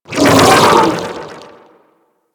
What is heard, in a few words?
Amalgam Override Attack Dash; Warframe